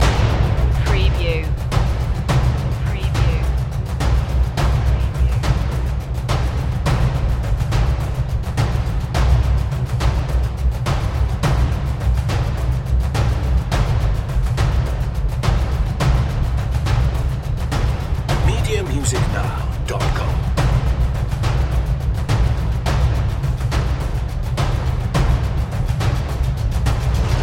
age of exploration loop

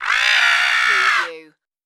Jurassic Creature

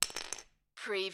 Lego, single lego piece drop on floor

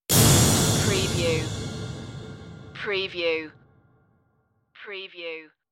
tv intense stinger

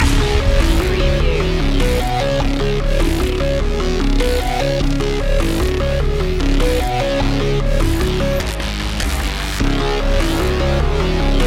hybrid human loop

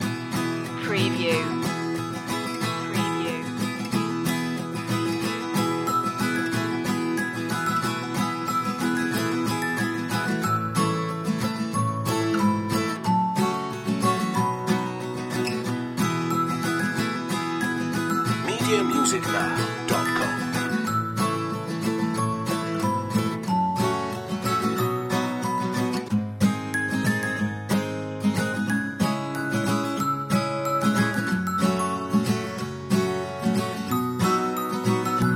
guitars and bells

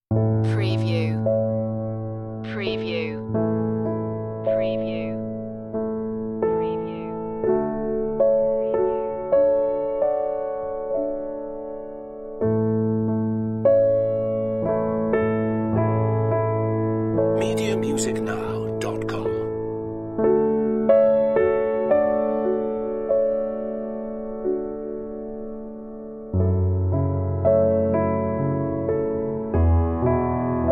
piano only

